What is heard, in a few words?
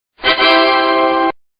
startup tada; windows 31